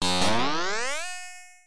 Video Game Springboard Trampoline Jump

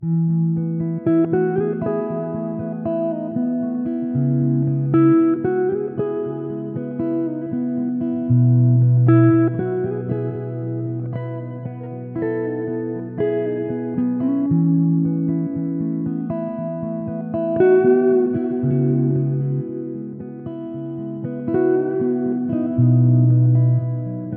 Electric Guitar Melody

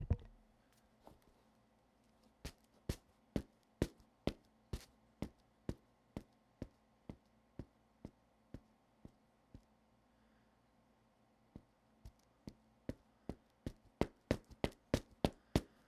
Footsteps

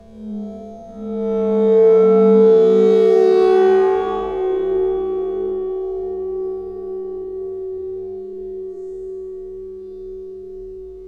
Stretched Metal Rub

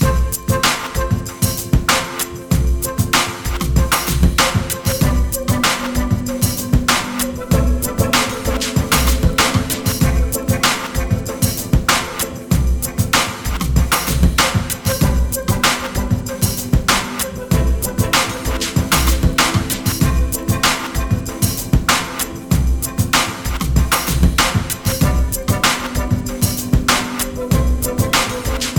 Lovers Beat 96bpm

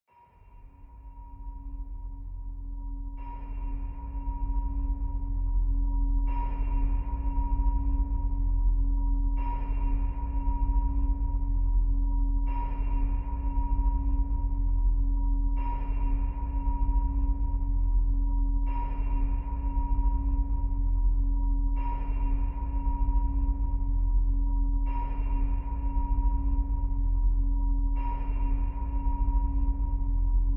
Haunting Atmosphere For Scary Scenes

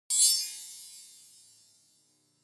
Ice Shimmer

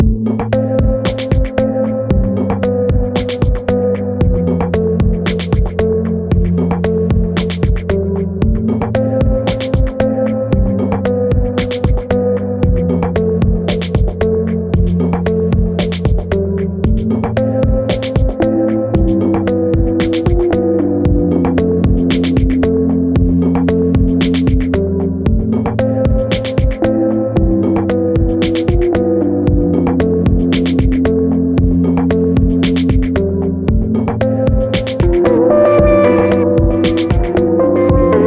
Melancholic Electronic Music Intro

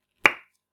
Pop

